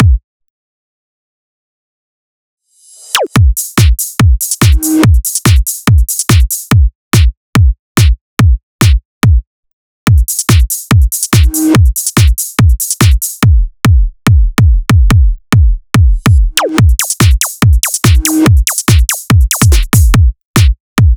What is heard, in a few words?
Hyperbass Drum Loop 143 BPM; Cymatics